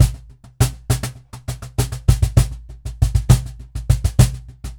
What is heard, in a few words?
Cajon Loop 100BPM; musicradar